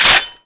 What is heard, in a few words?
spas up